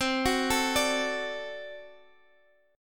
D Major C, guitar

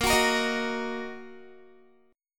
Bb Suspended 4th Sharp 5th Bb down Guitar; strummed; tuning 4E,4A,5D,5G,5C,6F

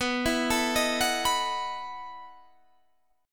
E Major 7th Suspended 2nd Suspended 4th

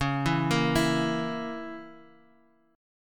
C sharp Minor 6th, Guitar, Drop D

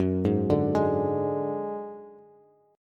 Gb Suspended 2nd Sharp 5th Gb, down, Bass; arpeggiated

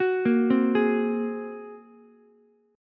Bb 7th Suspended 2nd Sharp 5th Bb Ukulele, chord; Bb7sus2#5; arpeggiated; 11,10,8,11; JGuitar

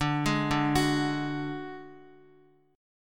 D Major Flat 5th D Guitar, chord

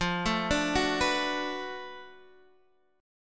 B-Minor 7th F; Bm7/F; arpeggiated; JGuitar

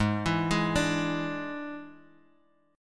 D Suspended 2nd Flat 5th Ab, guitar

